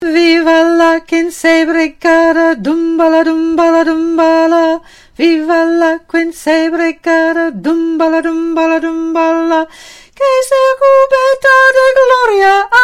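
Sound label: alto soprano; Viva la Quince Brigada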